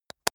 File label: makeup box close short; haus-garten; badezimmer